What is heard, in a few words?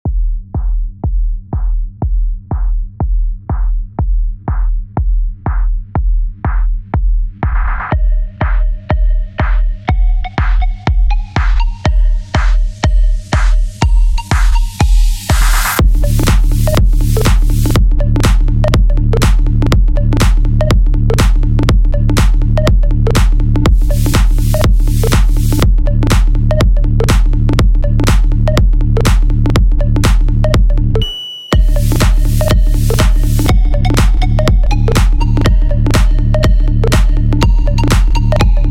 techno Full Mix; MIUI12